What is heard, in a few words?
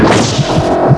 cloak